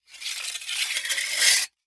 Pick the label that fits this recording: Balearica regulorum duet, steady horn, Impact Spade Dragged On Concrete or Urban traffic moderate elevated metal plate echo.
Impact Spade Dragged On Concrete